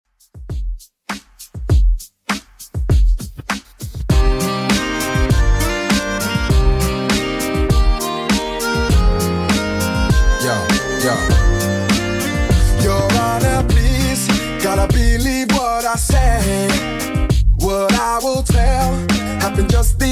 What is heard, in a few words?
2ks Redrum 100bpm CLEAN